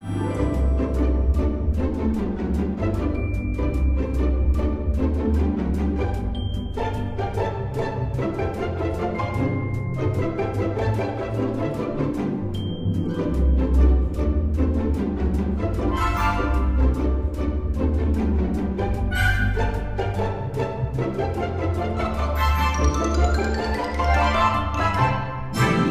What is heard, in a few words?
Comedy Background Music